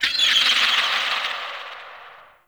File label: Corrupted Beach Seagull Day; Ambience